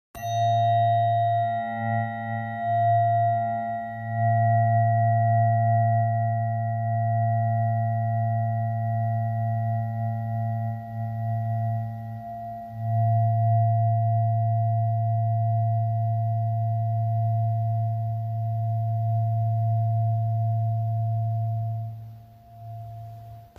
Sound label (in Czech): 111 Hz, terapeutická ladička hliník